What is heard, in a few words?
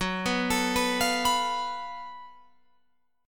F sharp Minor Major 7th Double Flat 5th, Guitar, Open F; arpeggiated; 18,18,21,18,20,18